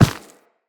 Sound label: Operator Run Dirt, Footsteps; Characters